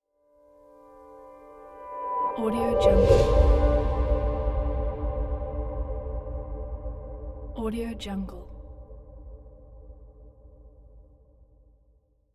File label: logo swoosh whip